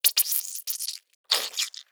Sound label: Squeeze Spurt, Gore; SoundBits; JustGore